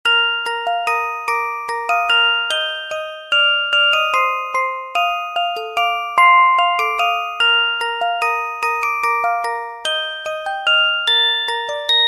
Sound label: melody music; hip hop